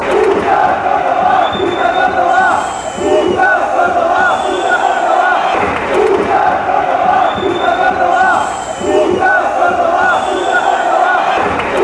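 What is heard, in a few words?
cordoba, football chants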